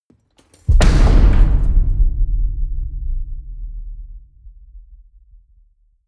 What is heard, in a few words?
metal crash ring large